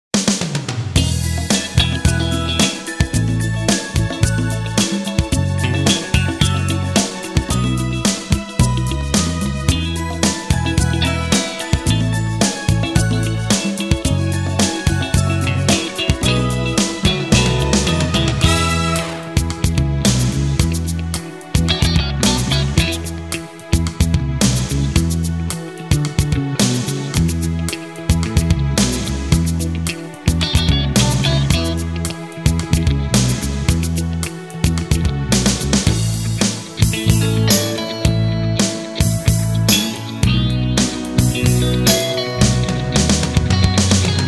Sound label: 80s Pop Parade